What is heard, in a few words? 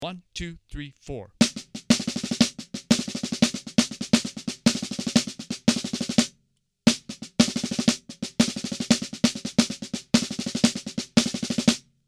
Melody Snare